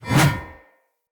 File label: Two Handed Mace Whoosh A; Weapons; Enemy; Blunt; Sinecure Two Handed Mace